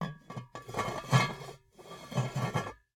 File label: Cookie Jar, ceramic, glazed, irregular shape, hollow base, hollow top, rough, resonant, tonal, fiddle; adjust; Eiravaein Works; Vaeyan IV